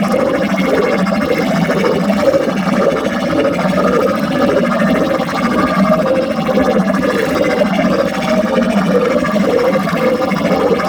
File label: Magnetic Bubble Loop; Warframe